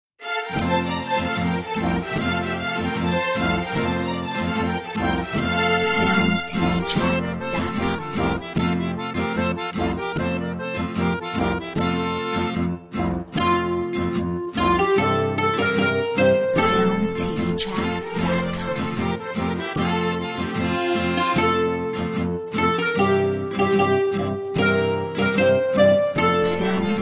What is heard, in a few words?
Greek folk dance